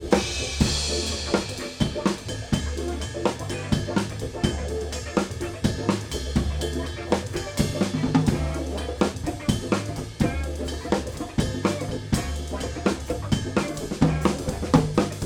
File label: Overheads Sennheiser ME40; FMR Audio RNP 8380